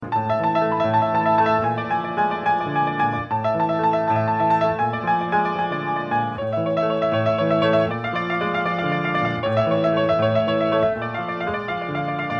Modern Love Waltz

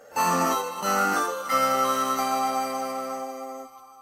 Level Up

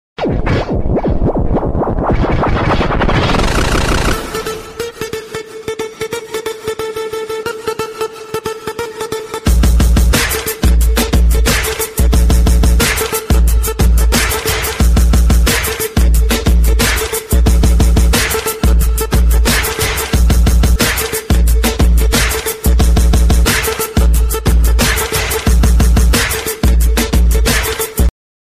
Echo

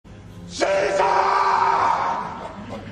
Yell; Koba; Caesar

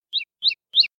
Three Bird Chirps

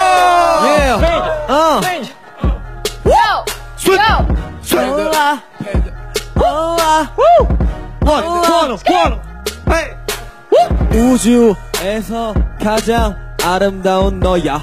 Rapping

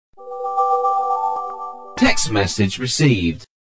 Text Msg Recieved

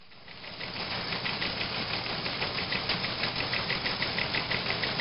Teletype

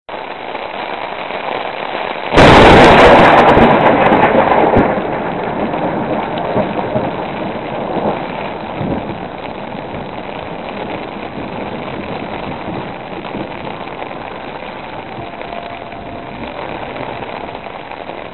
Thunderstorm

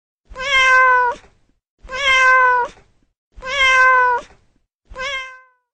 Cat

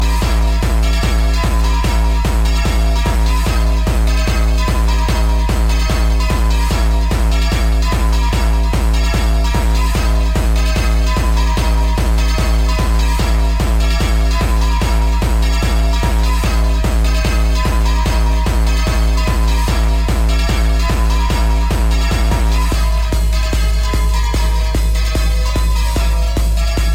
Jumpstyle

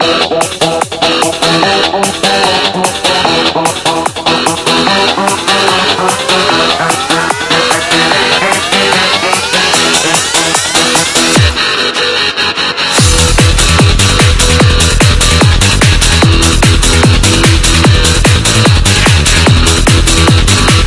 Techno And Trance

